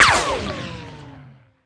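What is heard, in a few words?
ricochet concrete; czero opforce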